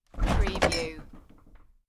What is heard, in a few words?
Refrigerator Door